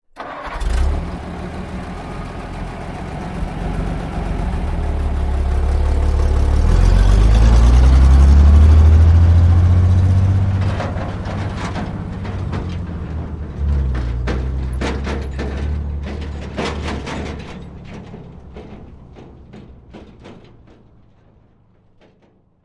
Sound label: Truck 2.5 T Start Idle; 2.5 ton